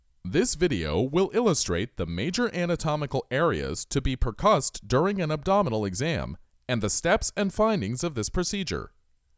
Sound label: Percussion; Ab Percussion